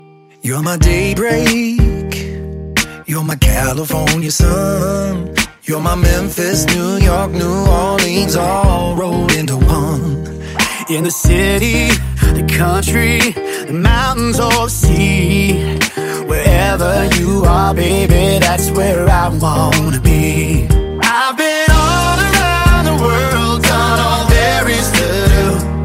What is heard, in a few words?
english ringtone